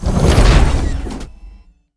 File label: attack, combat creatures big droid; droidic sounds; CosmicRageSounds